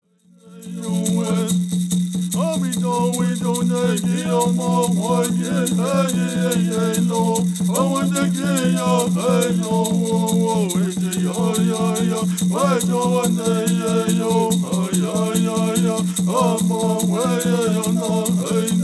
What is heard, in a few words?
Peyote Song